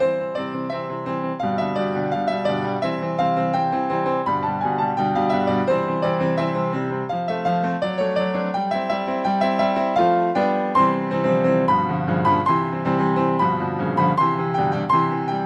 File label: piano solo; offenbach